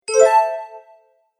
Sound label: Magical Message Notification; Musical Sound Effects